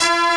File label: Boss Defeated; MMX; X2